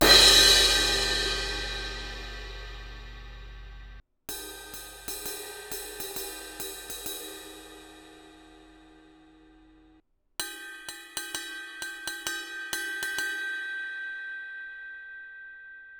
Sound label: paiste 17 inch fast crash crash ride bell; signature